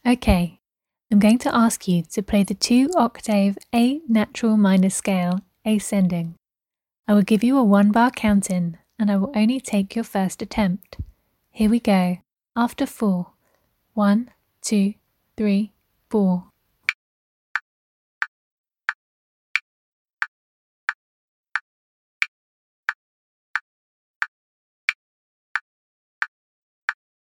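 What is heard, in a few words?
2 octave natural minor ascending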